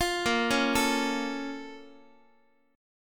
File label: A sharp Minor Major 7th, down, Guitar; A#mM7 arpeggiated; JGuitar